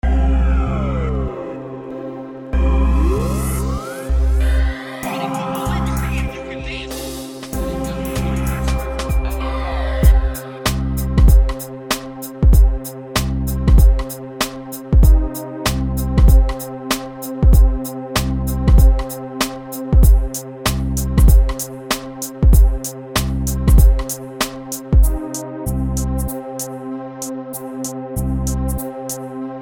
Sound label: Instrumental